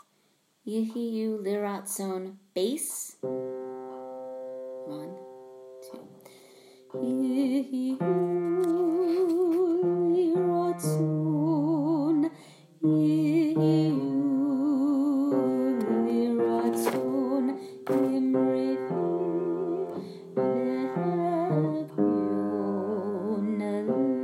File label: Bass; High Holidays; Yihyu Lratson 2019